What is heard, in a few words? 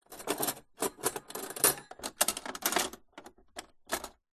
cutlery touch light in kitchen drawer; household